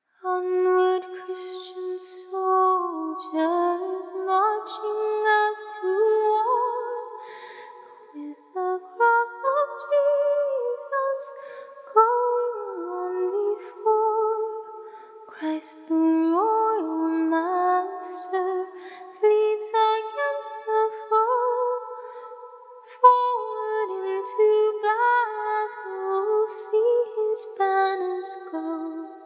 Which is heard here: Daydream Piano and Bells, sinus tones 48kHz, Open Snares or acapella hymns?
acapella hymns